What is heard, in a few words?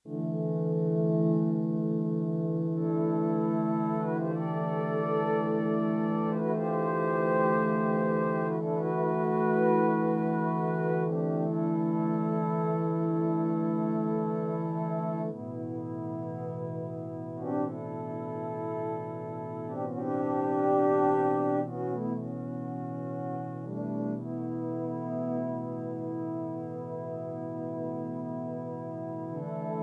D50 patch Jazz Tapes; Jazz Tapes: With an almost mellotron like tape warmth; ROLAND